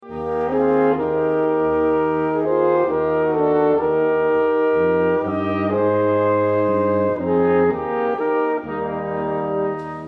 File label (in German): Spezi Polka